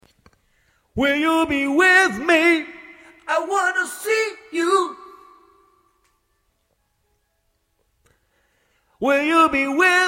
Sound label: rock 150 C; acapella